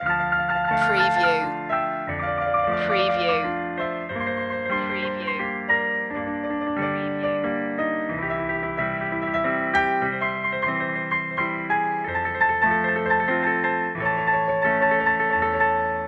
silent movie heartbreak loop

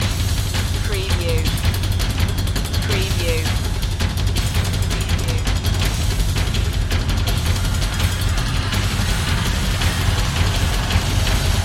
percussion loop

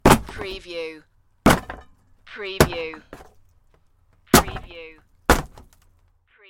Wood Bashing

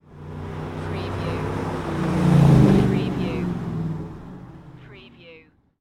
Truck 4.7 Liter Engine Drive By Center

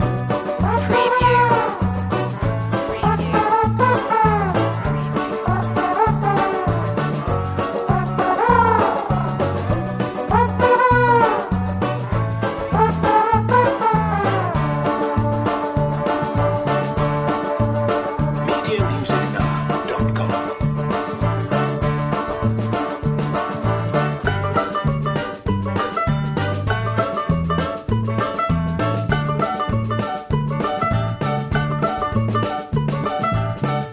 jig

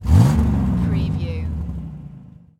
Power Car Single Rev Short

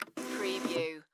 Label Printer Print; Sound Effects Bible

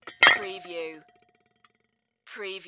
Morgue Tool Set Down

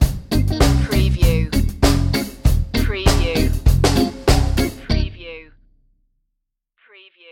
reggae stinger

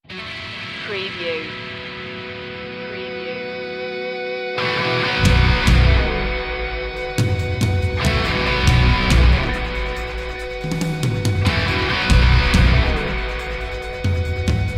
thunderbolt

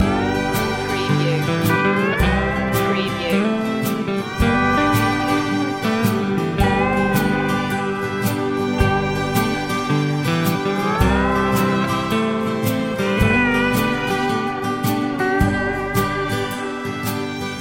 country walk loop

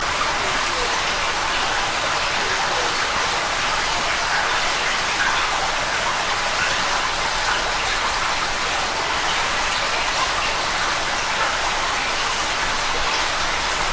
Waterfall In Cave Loop

